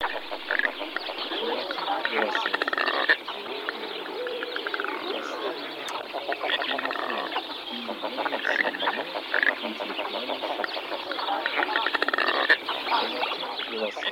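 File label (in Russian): Луток Pesciaiola, манок; plurifon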